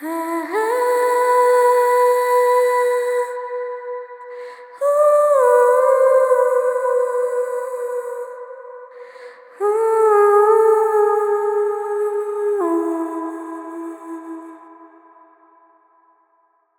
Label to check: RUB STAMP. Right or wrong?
Wrong